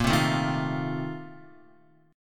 Bb Major 7th Suspended 2nd Suspended 4th, Guitar; x,1,0,2,3,1; BbM7sus2sus4 strummed; JGuitar

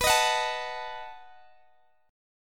B 7th B down Guitar DADGAD

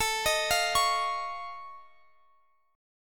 F Augmented 7th A, chord

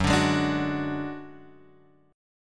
F sharp Suspended 2nd Sharp 5th; F#sus2#5 Chord {2 X 0 1 3 2} strummed

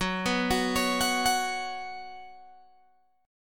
F sharp Suspended 4th, Guitar, Open G

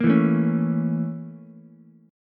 Bb Minor Major 7th Flat 5th Bb, Ukulele; BbmM7b5; strummed; JGuitar

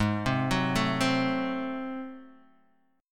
Ab 7th Flat 9th Guitar Half Step Down, chord

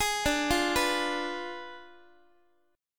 D Diminished 7th D, Guitar; arpeggiated

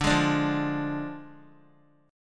D Diminished, Guitar; Ddim strummed; x,5,8,7,5,x

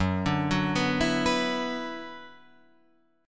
Gb 7th Suspended 4th Sharp 5th Gb Guitar Drop D

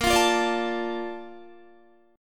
A sharp Major 7th, Guitar; strummed; chordshape; 17,20,17,19,17,17

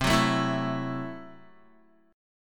C 6th Flat 5th, C, down, Guitar; strummed; JGuitar

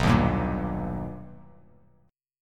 B Augmented Major 7th B Bass Standard, chord; strummed; JGuitar

